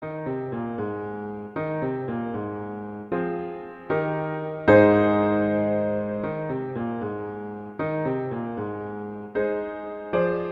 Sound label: Barcarolle; Burgmuller; Op100